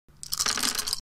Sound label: dice roll